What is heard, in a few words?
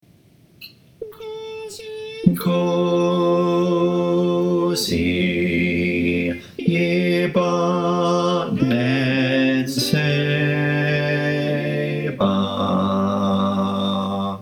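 bass, choir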